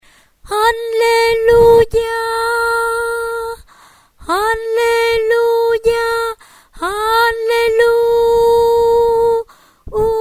tenor

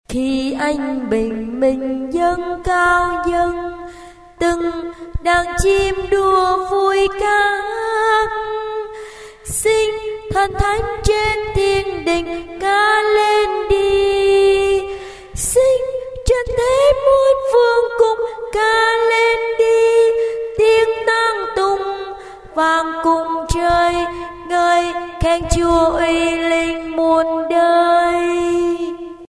alto

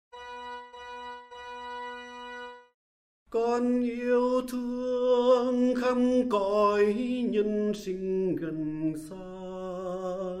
organ

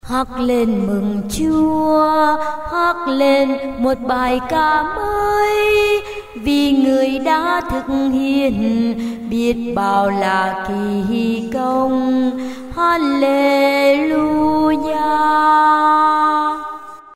alto

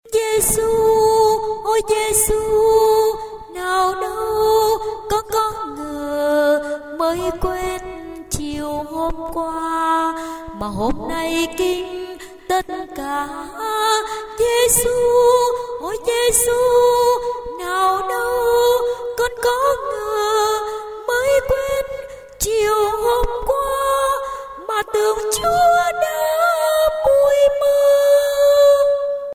soprano

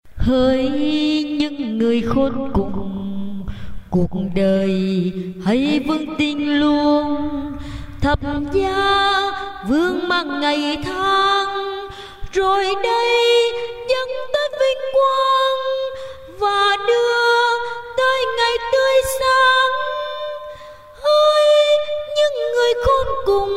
tenor basso

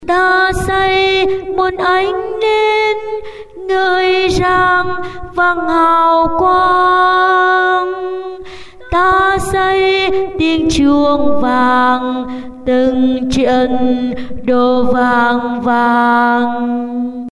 alto

